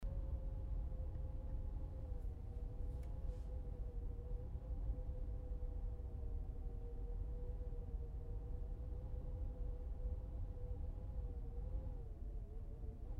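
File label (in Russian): дверной сквозняк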